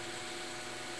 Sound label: Loop, Train; OpenBVE